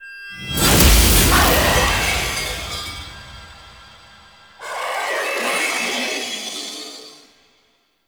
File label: Anger; Cinematics; Intro Throne Room; Ballas